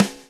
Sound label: GROOVE 92; AMG Gota Yashiki Groove Activator